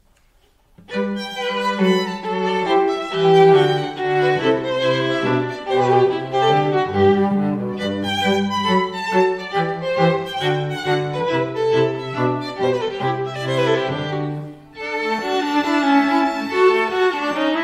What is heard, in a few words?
divertimento trio; vanhal